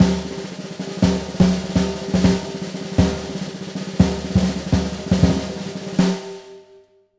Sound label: 160 BRKBT; Univers Sons; Jazzistic